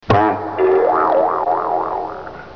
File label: Crunch sms